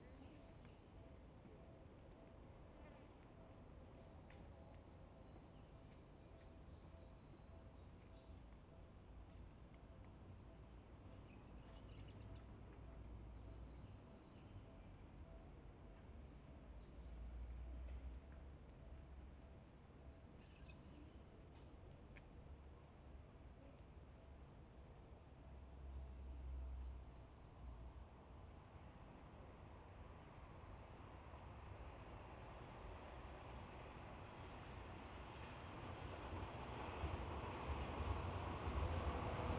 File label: Binaural, walk; Fild recrding walk